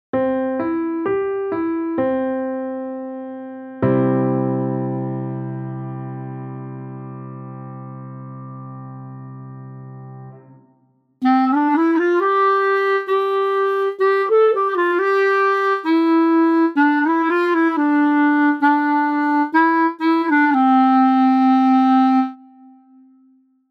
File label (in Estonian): Klarnet; c-duur